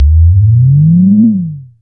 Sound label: SYNTH FX